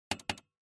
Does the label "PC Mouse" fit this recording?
Yes